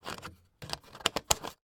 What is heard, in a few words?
food drink milk bottle plastic lid close; sound effects one